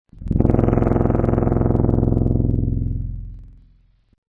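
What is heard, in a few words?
brass Trombone, Wavetables; Synthetic's Serum Collection; Standalone-Music SYMPHONY Wavetables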